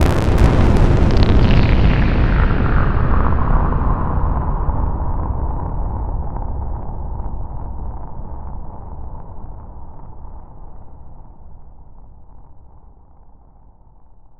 cables blast r; Half Life Alyx; music; vault

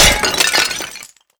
break pottery; physics; breaking

